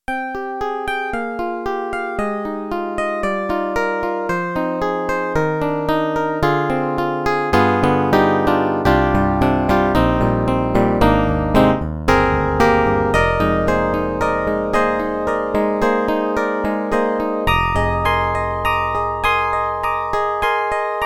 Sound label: Roland MT-32 Acou Piano